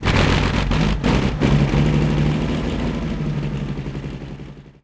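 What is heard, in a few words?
cromwell engine interior load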